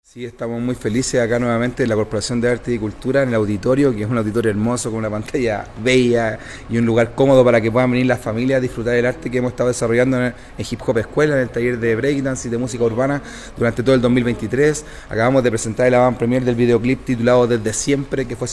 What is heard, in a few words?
HIP HOP